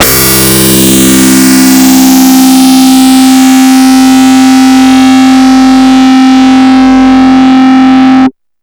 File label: DIST STACK; Big Beat Samples & More; AKAI